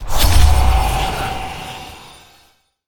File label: Arcane Explosion; SPELL MA Revamp; mythic-killstone